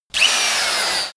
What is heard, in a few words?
sander turn on short